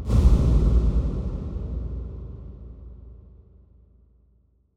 Huge Verb Tail, Long Reverb Tails; impact samples

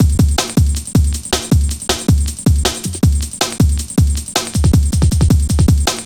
Drum Loops, 158.5; Jungle

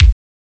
440 thick; ST-XX Amiga Soundtracker Sample Packs

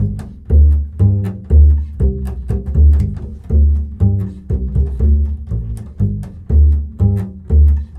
Bull Fiddle, Appalachian

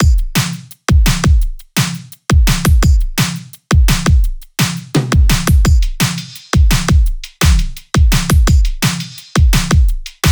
loop full 170; Seismic Loops

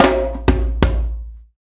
PRC HAND, Africa Perc Loops; Roland LCDP14 Africa VOL-2

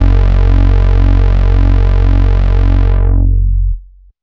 Stick Bass, Drum n Bass; KJ Sawka; Drum n Bass & Beyond

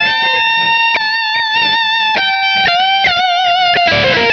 guit lead; Sonic Foundry (Sony Creative Software); Ugly Remnants VOL-2; Leads

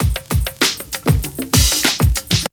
break; Zero G - Man Machine

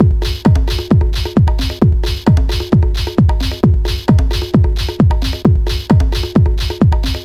DRUM, 132; Megabass Remix! 2

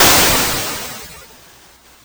C64 Explosion; 8-Bit; Chiptune; Commodore 64; FX

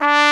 SOFT TRUMP G; E-MU Formula 4000 Series Vol. 5; Protozoa

